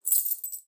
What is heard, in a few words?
Coins